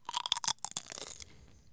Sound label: Crunch Eat